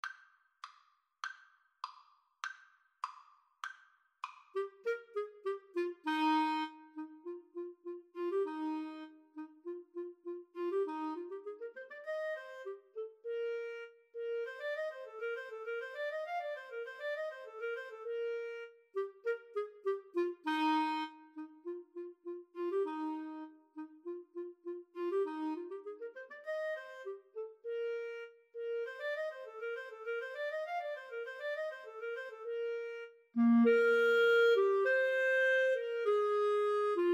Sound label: mozart k487, Clarinet-Saxophone Duet